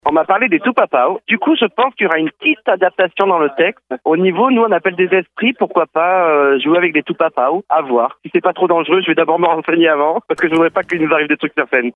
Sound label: AAAHHH